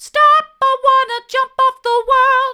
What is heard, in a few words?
stop i want to jump off the world mid, vocal